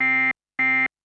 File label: low fuel; lander